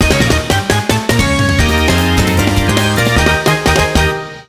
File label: Jingle ~ Mission Clear!; Mario Super Sluggers; Wii